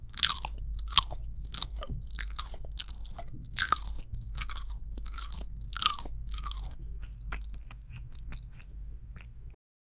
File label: realistic asmr of, pudding